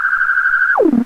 monodelay Fm - 82, synth loops

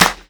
clear steel snare drum sound f sharp key

